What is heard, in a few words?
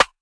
perc crack; Hip-Hop; Drum One Shots; SD CAICOS Hip-Hop Sample Pack; Slate